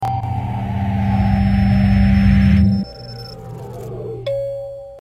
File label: elevator full